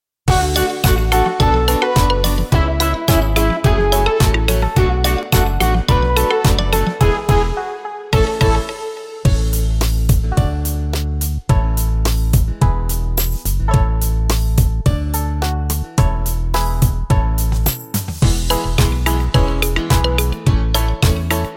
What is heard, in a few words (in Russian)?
минусовку